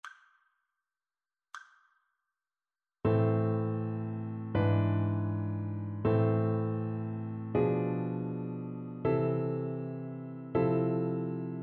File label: harmonic dictation